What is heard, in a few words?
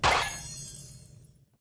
box create